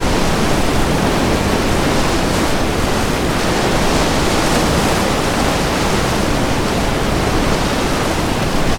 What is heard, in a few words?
water river rapids deep fast; sound effects one